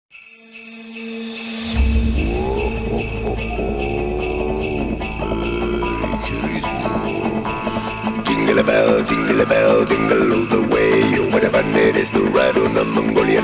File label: mongolian jingle bells, club